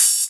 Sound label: Open Hihat; Vengeance Samples; VEE Cymbals